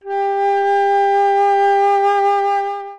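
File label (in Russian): флейта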